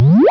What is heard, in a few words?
keno winner; Boxcars Casino